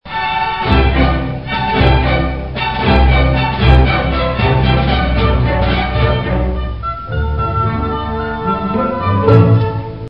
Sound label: oboe